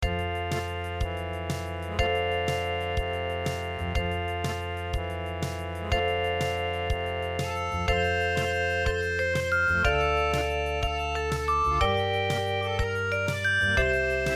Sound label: Paris Musette